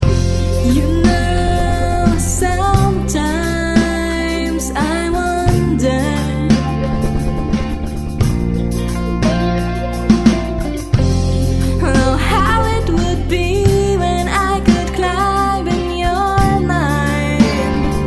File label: Rhythm